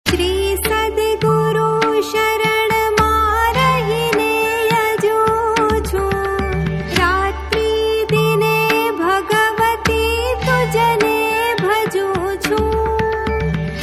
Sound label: Stuti Ringtone; Vishwambhari; Shri Sad Guru; Navratri Special